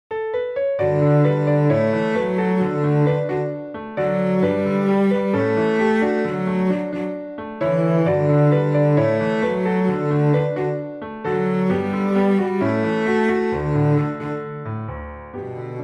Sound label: Cello Calipso; Besetzung: Cello und Klavier; Tonart: C-Dur